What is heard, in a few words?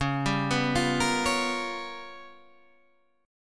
G 7th Sharp 9th Flat 5th Db